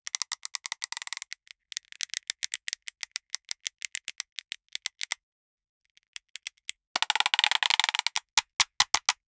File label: close mic mp5; short decay